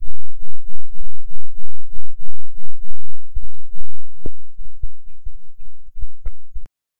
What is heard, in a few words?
subtle machine scanning